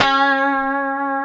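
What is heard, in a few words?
D 4C, compressed Strat, guitars; Spectrasonics; Hans Zimmer Guitars VOL-1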